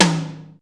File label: ROOM T, ROOM KITS; Crypton - DrumBase5000